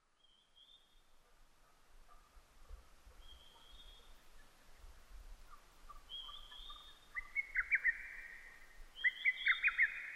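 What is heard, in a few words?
lesser cuckoo, Hodgson's hawk-cuckoo; hototogisu; jyuuiti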